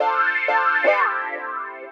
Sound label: house synth 125 A, keys